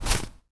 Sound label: player dirt; svencoop; arctic incident